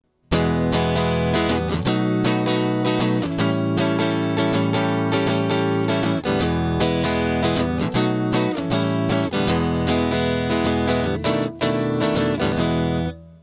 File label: blues prog